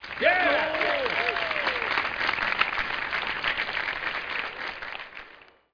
crowd long shot

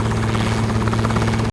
HELI; Rabbit-Wars

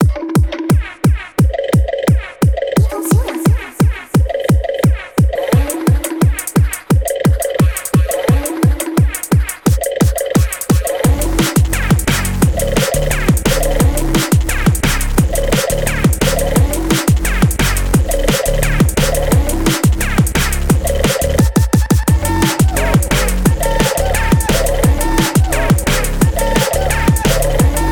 GOOFY AHH BEAT

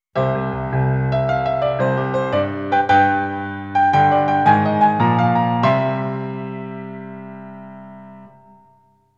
World Clear Fanfare; NINTENDO

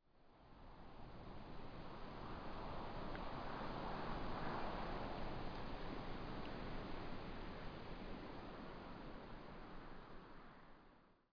winds

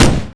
GUNSHOT; Rabbit-Wars

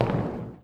step metal; OneShot Fading Memory

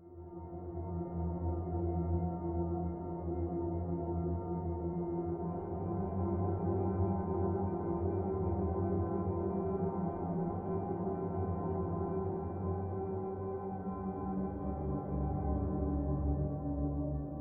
ambience; OneShot Fading Memory

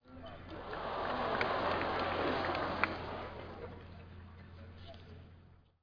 crowd over

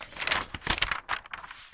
newsprint; OneShot Fading Memory

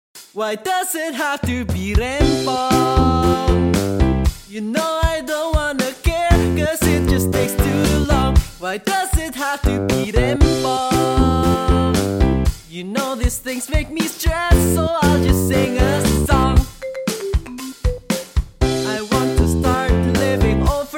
song sketch

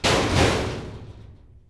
elevator bang; OneShot Fading Memory

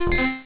disconnect

